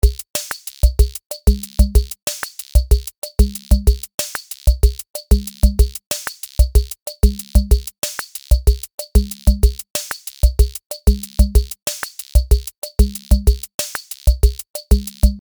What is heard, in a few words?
combo; tiny pops; superwave